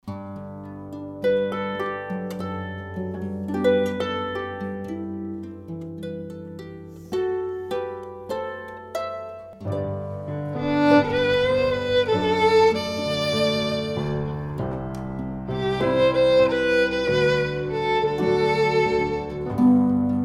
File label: Violin Harp Piano Trio